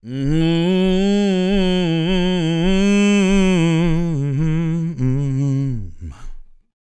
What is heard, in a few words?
MOANIN, Vocal Planet Blues Soul; Spectrasonics